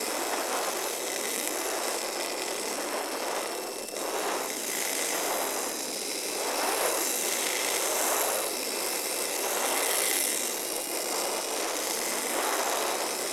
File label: Ball Angry Loop; Warframe; Tenno Ball; Missions